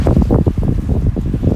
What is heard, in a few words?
wind